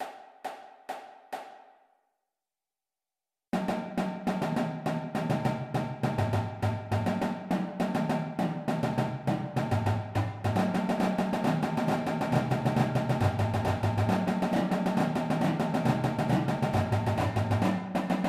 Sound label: Flams; Beginner Flams; Drumline Warmups